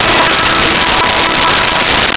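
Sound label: Brass Section E4; Nintendo; July 2020 Gigaleak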